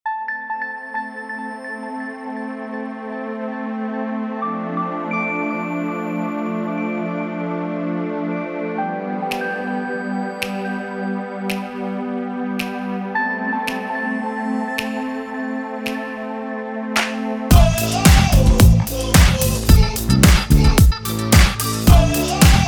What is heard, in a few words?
Groove